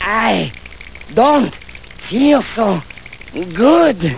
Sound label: got hit; Digital Paintball 2